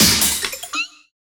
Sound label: 144 ELEC LP 4, Industrial Loops